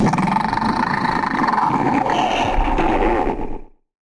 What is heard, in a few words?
attack; Barotraumatic; Crook